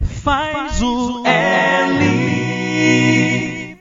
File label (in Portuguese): vinheta